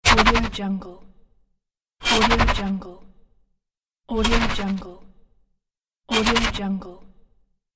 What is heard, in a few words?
auto car stop abs brakes; tonwagensfxlab